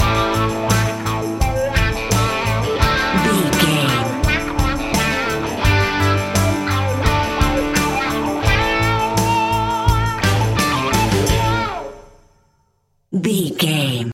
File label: dad rock bumper